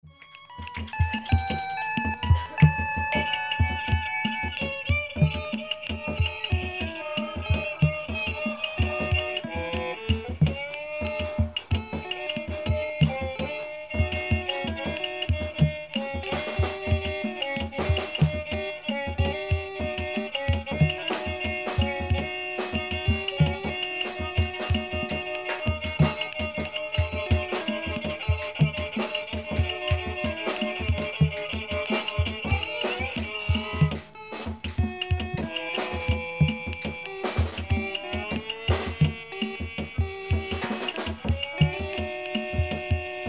violin drum organ; kaosphere Orchestra; circus mutations

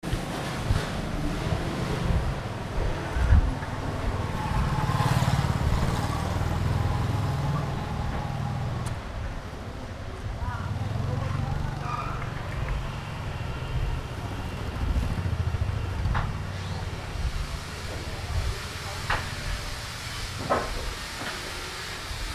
streets ambient noises, phnom penh; 2019; Cambodia phnom penh

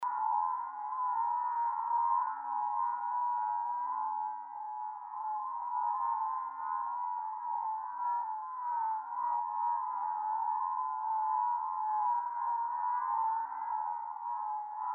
electric gamelan WOLF WIND HIGH

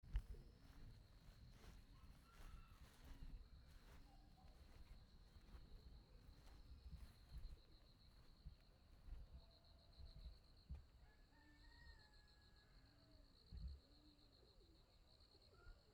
night buzz, Kathmandu Ambient sounds; Ichangunarayun

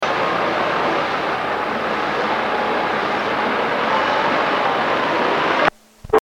shrine dictorphone mexico, street recording

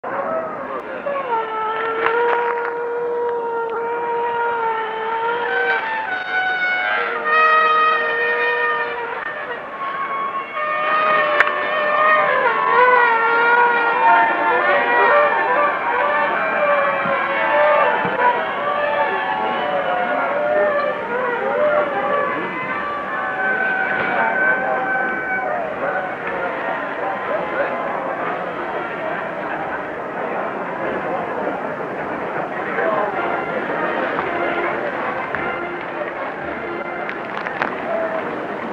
shrine dictorphone mexico, street recording

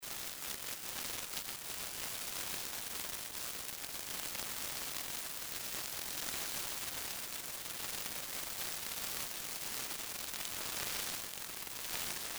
electric gamelan RADIO CALM STATIC

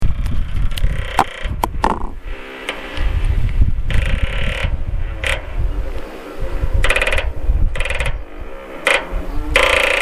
industrial squeek

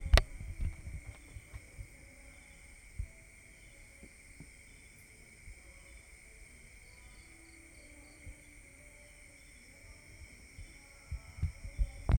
night frogs, South India; South India street recordings

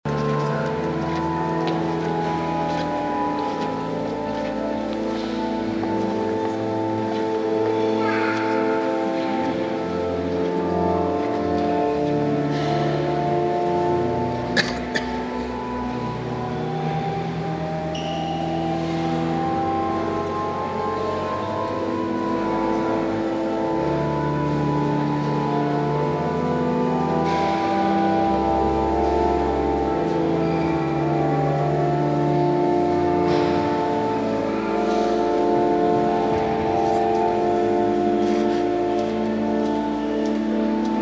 church organ and atmosphere echoes; Spanish street recordings

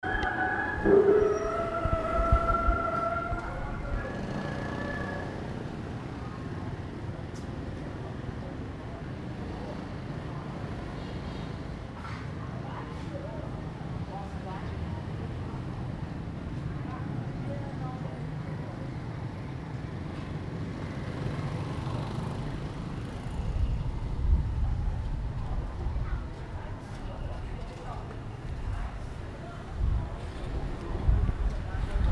ambient streets, phnom penh; 2019; Cambodia phnom penh

